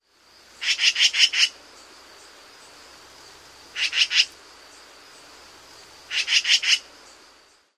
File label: shek-shek-shek calls, YEBIMA